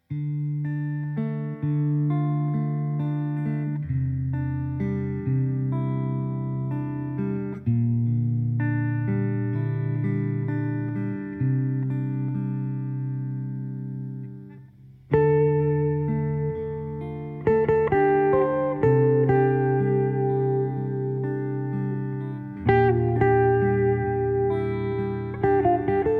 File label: Guitars; Fremder Tag Motiv 1 F